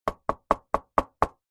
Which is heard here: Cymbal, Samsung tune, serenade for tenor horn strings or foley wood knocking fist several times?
foley wood knocking fist several times